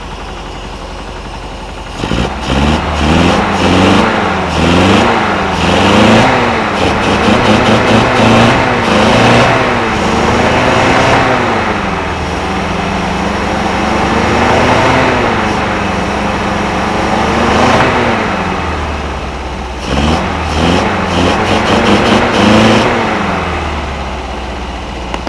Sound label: engine